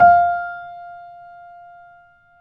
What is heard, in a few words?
BOSENDORF